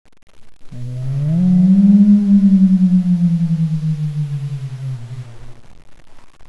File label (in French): fantome; csde rambo halloween